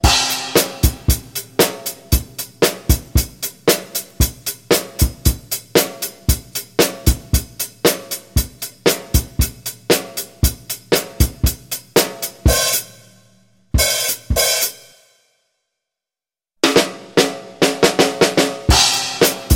anfaenger drums; musikschule; instrumentalunterricht

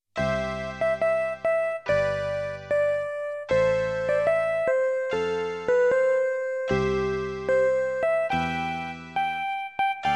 anfaenger keyboard; musikschule; instrumentalunterricht

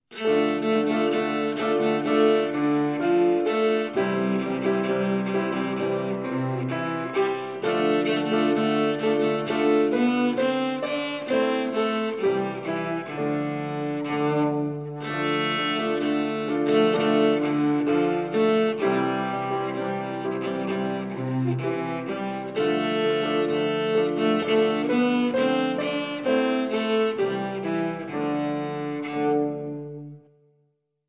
anfaenger cello; musikschule; instrumentalunterricht

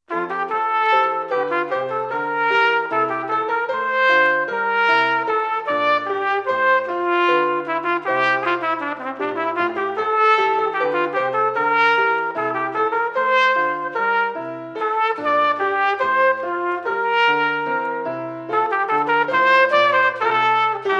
fortgeschritten trompete; musikschule; instrumentalunterricht

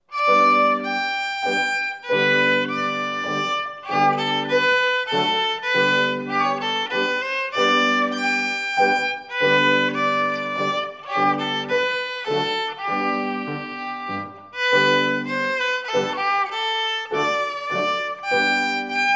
anfaengerspiel violine; musikschule; instrumentalunterricht

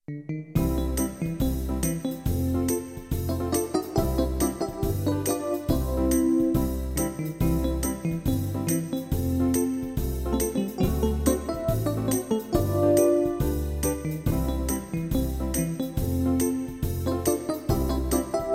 fortgeschritten keyboard; musikschule; instrumentalunterricht

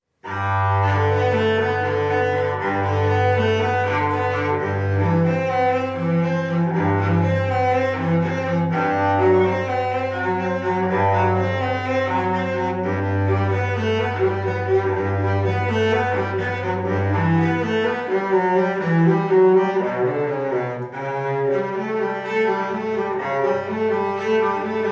fortgeschritten cello; musikschule; instrumentalunterricht

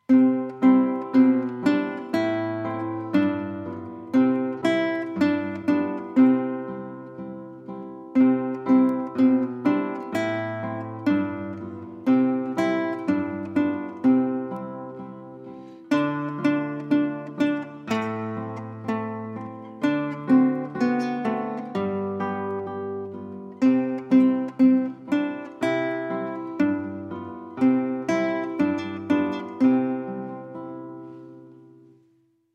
anfaenger klassische gitarre; musikschule; instrumentalunterricht

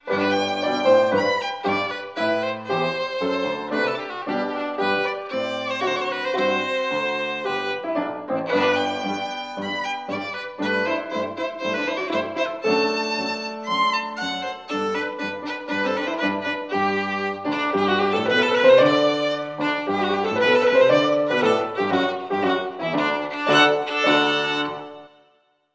fortgeschritten violine; musikschule; instrumentalunterricht

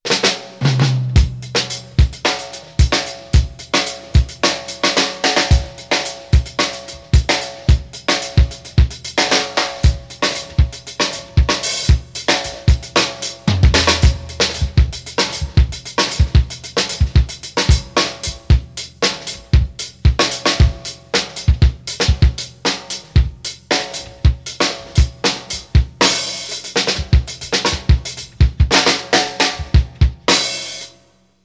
fortgeschritten drums; musikschule; instrumentalunterricht

